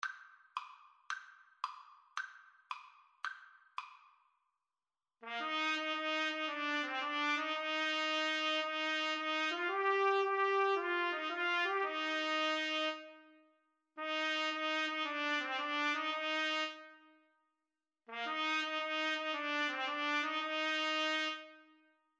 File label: itsy bitsy 2 TPT